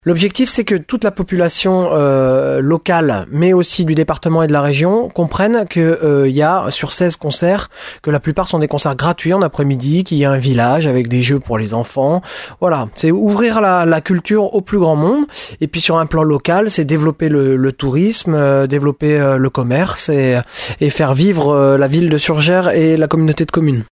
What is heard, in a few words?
brass festival